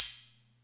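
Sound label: Atlantex Music Percussion Computer hi-hat closed; Atlantex MPC-1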